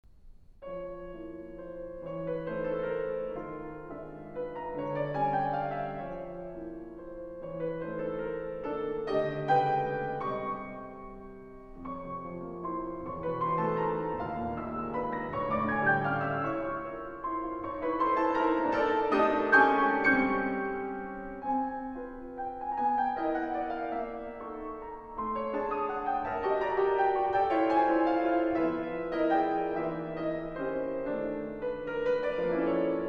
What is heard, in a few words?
Suite for Piano; 3ird movement Excerpt; Suite for the Piano